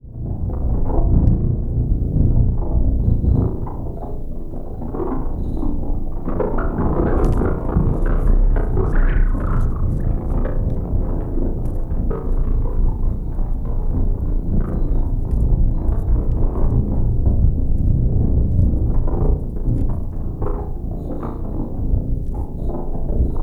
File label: solar skyharp sample